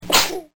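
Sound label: little babies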